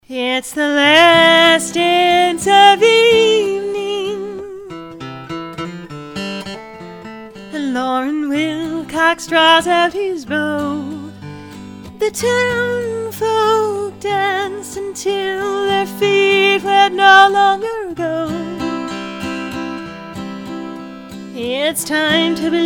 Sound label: Waltz